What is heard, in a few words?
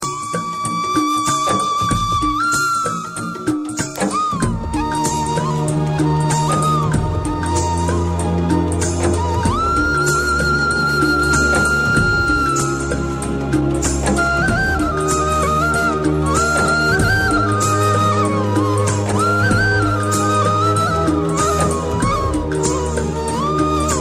so sad flute